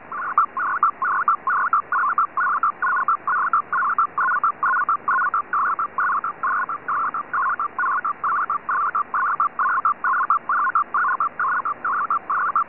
Spanish TWINPLEX

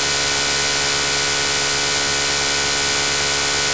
TETRA Sound

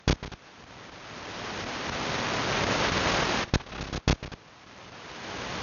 IETMS AM

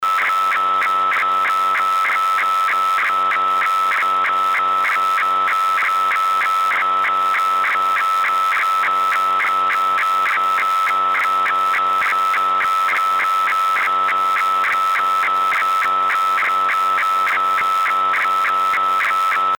166900Mhz

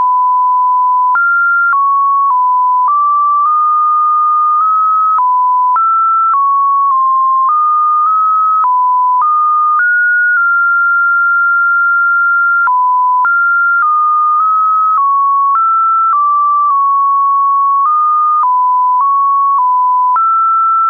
JT9F; JT9F, from WSJT-X with default 1500Hz tone setting

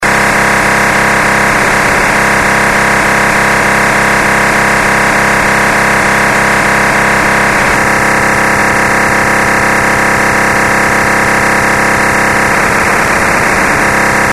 DMR

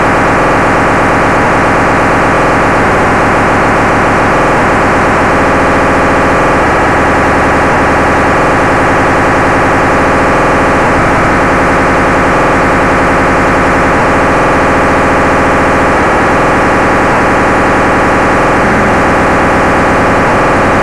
Motorola 2F1D Sound; Motorola Type II Radio trunking control channel. 136 MHz — 941 MHz NFM FSK 16 kHz

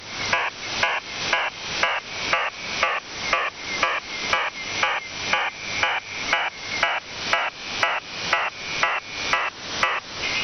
Data burst 4623 kHz; UNID

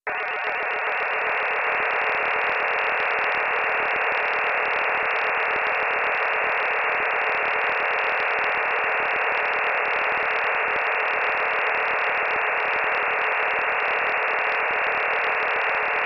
Digital sstv

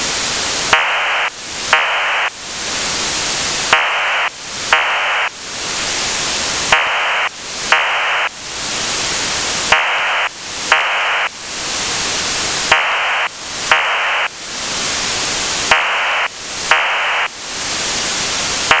CIS OFDM-121 sounding mode to normal; on 20.386

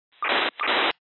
150.91 MHz, NFM

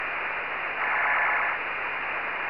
FSK441 Burst

